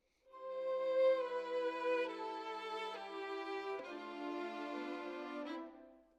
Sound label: Violin Solo